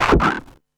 Percussive Hit, contact mic; Percussive Hits; musicradar; contact mic madness

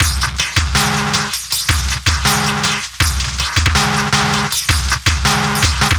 Loop; Sonic Foundry (Sony Creative Software); Esoterik Beatz; Morph

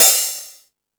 Percussion FX, Drum Machines

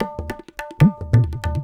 Tabla; Sonic Foundry (Sony Creative Software); World Pop

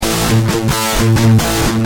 Guitar Heavy, Industrial

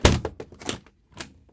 SUITCASE, Tools; AKAI S6000 CD-ROM - Volume 6

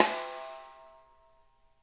SNARE RIM; Sonic Reality; Interactive Drum Kits

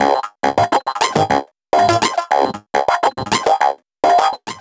Drum Loop, Drum Loops

